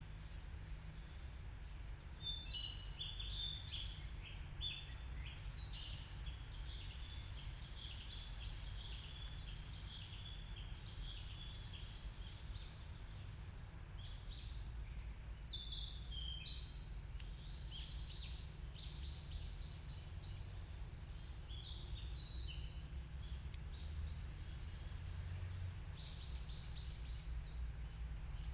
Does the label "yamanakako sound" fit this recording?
Yes